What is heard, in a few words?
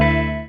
bleam; Truth-Hz - 1-Hit KO Sample Pack